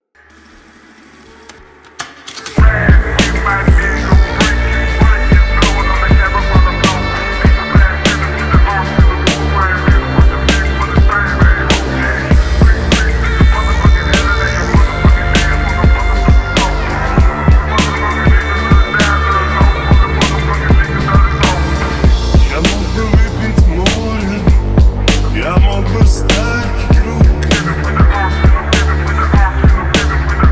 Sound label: phonk remix